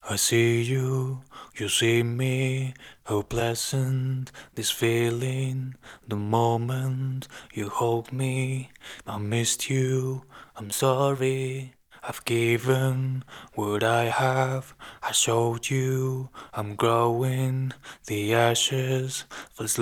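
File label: Pop EDM Dance, 80, D flat major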